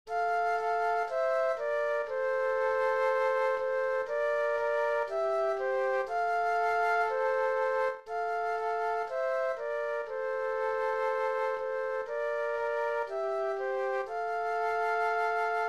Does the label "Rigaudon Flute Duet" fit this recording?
Yes